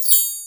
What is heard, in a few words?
Bell Tree